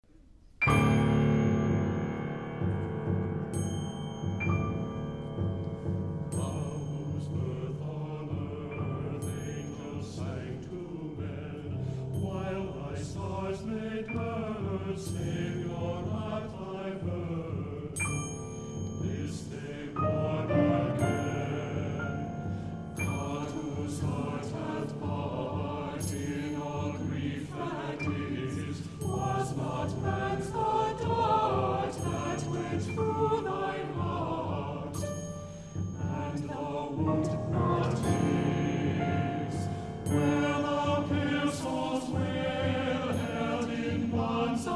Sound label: Dirge